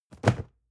cardboard box medium fall low hard; objekte

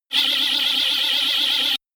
shot buzzing wavy tooth; zaps buzzes

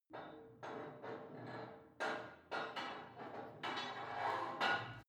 put weight onto barbell unsteady reverby; sport freizeit; fitnessstudio

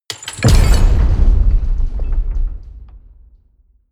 door slam large

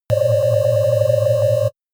shot ringing wobble

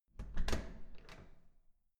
door open

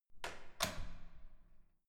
security door close soft

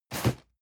cardboard box medium slide short hard; objekte

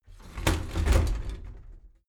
shower slide door inside close regular halfway

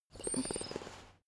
dog teeth chatter squeak; natur-tiere; hunde

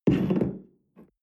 espresso place tablecloth slide wobble

